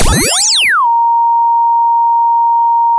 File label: pop, sound of a bike bell